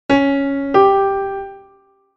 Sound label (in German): Intervalle sukzessiv